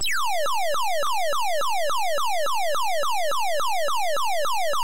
Destroy Monster

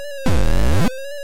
Consume Fruit

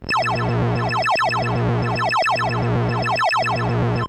Going Home; ET

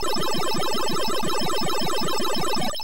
Eating Wafers

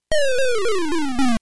Dying

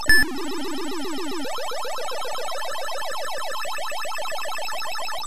Eating Power Pill

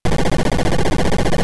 Ramp up

